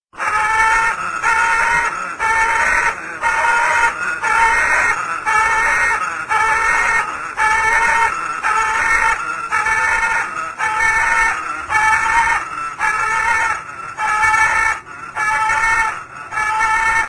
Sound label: macaw